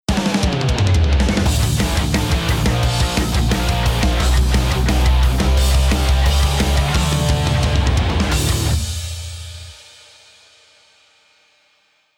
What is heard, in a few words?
Riff